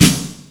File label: Snr Heavymetal; Zero-G; Soundclash